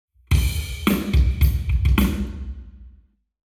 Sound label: Hall, Drums 0deg; eMagLS